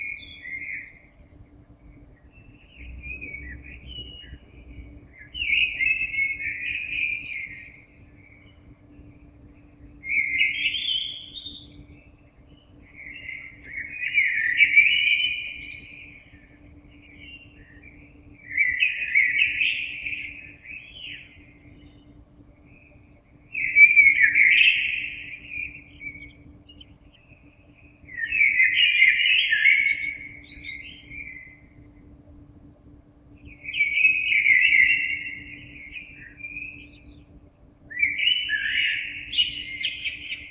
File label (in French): Merle